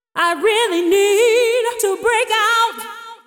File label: deep house female, 125, A, vocals